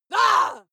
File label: female lunatic scream short